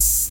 rich top end open high hat a sharp key, open high-hat sound tuned to the A# note